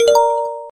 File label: Recording Start; LG L-01B